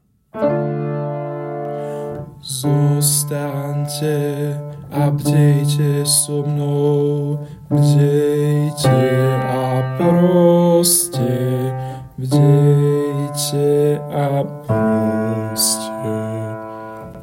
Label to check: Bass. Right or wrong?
Right